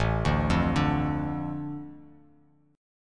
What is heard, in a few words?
A Suspended 4th Sharp 5th, Bass Standard; Asus4#5 arpeggiated; JGuitar